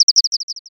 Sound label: sparrow